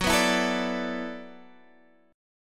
E 7th Suspended 2nd Suspended 4th F sharp, guitar

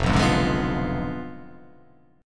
F Diminished 7th F down

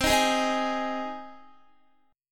C Minor Sharp 5th, Guitar; strummed; Cm#5; x,15,13,13,15,15; 4E,4A,5D,5G,5C,6F

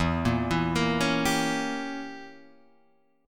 E Diminished 7th E, Guitar Open G

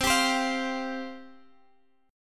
C Suspended 4th C Guitar Half Step Down

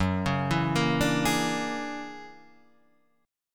Fsharp 7th Flat 13th, guitar chord; F#7b13 Chord {2 4 2 3 3 4} arpeggiated

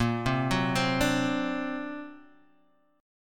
D Augmented 7th Bb, guitar

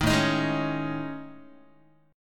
A Major 7th Flat 5th, down Guitar; AM7b5 strummed; 6,5,6,6,5,x; JGuitar

